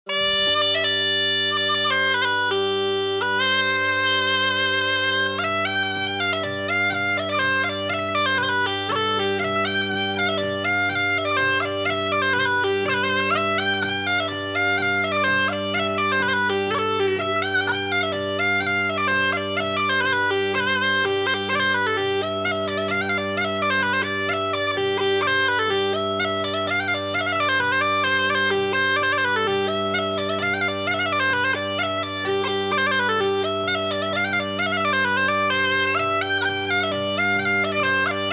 Polka; Polka de Naves

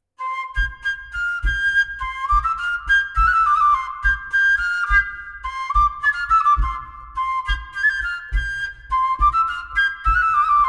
Bourree

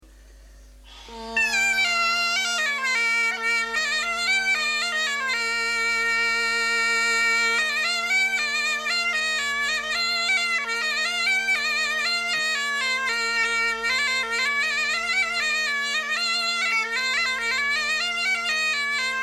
Sautiere; Sautiere de Gavinet

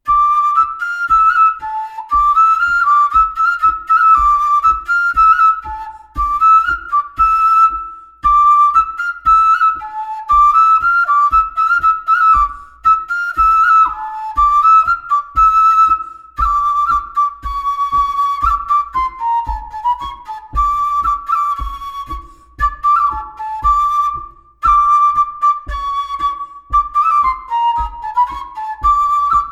Bourree savoyarde

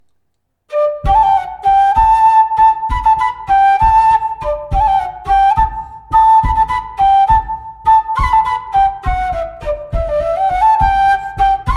Montagnarde; Recueil Laussedat

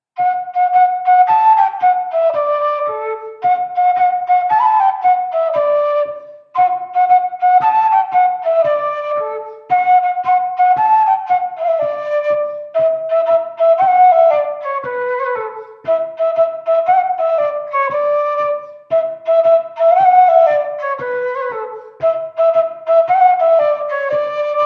Bourree d'Auvergne

